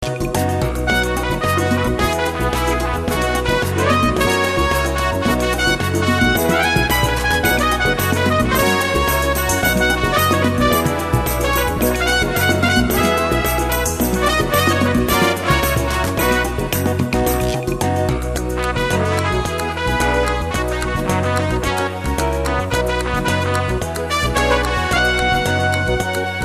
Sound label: Samba